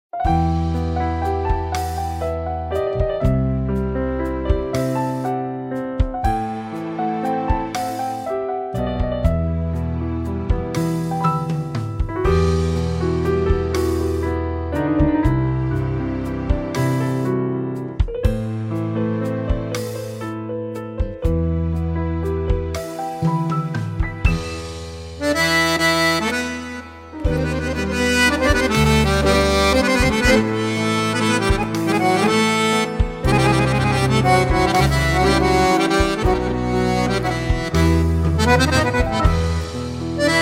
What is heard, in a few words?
Accordion